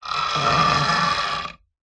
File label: lever metal large heavy movement creak; industrial